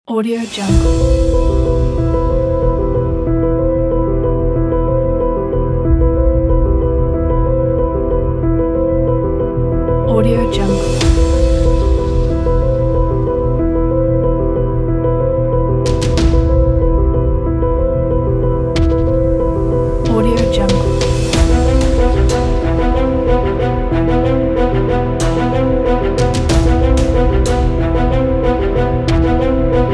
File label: short trailer intro